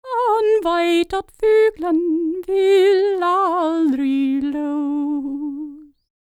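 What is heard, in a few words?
Scandinavian, World Voices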